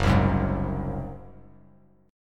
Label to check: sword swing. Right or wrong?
Wrong